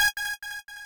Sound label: lead2 g#5